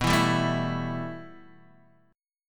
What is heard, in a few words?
B 7th Suspended 4th Sharp 5th, Guitar, Open D